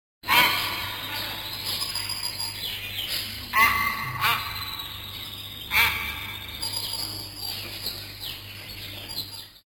Myna Bird

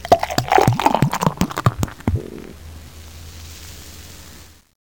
filling

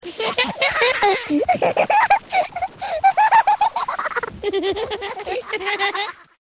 kids laugh

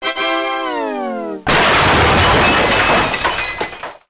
win crash

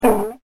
sealion

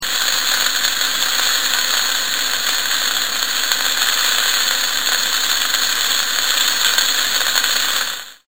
sizzle

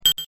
beep

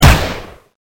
mini fire

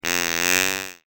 deer

